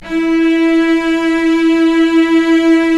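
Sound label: cellos; String Master Series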